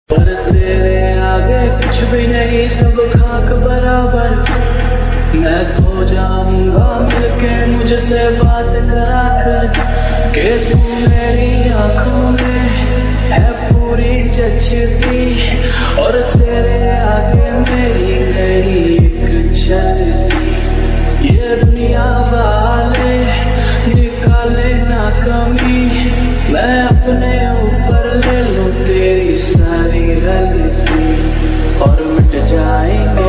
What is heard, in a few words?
love ringtone lofi slowed; mobile ringtone; slowed reverd